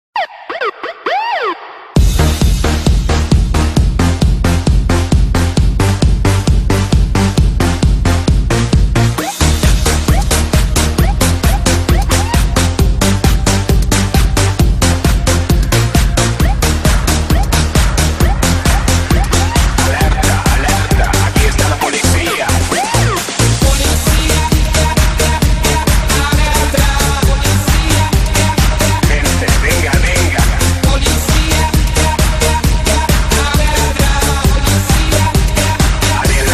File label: Police Siren Techno